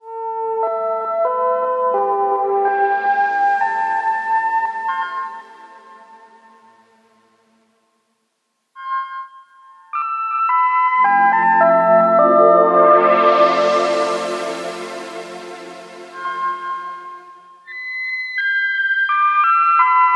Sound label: alarm, ringtones; sound of oo website ringtones